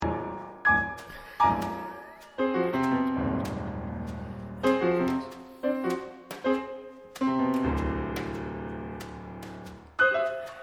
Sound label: blues records